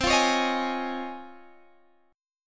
C sharp Major 9th, chord

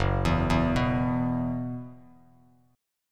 F sharp 7th Suspended 4th, Bass Standard; F#7sus4 arpeggiated

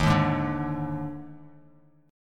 Eb Major 7th Suspended 4th Eb, Bass Standard; strummed; EbM7sus4

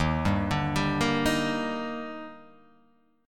D sharp Major 7th; arpeggiated; JGuitar

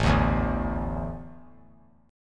A Suspended 4th; Asus4 strummed; JGuitar

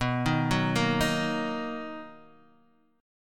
B Major 7th B down Guitar Open D

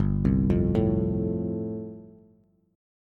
G 7th Suspended 2nd Suspended 4th, Bass; G7sus2sus4 arpeggiated

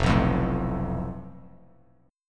F Major Flat 5th, Bass Standard; FMb5 strummed; JGuitar

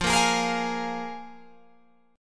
F Minor Double Flat 5th, Guitar, Open G

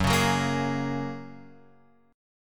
F sharp Major Add 9th, Guitar, Open F; strummed; x,1,1,1,1,3

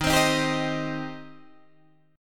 D sharp 6th, D sharp, down, Guitar, Open D

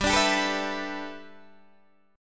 G 6th Add 9th G Guitar DADGAD, chord

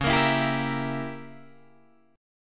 C sharp Diminished 7th C sharp, Guitar Open D; down; chord shape

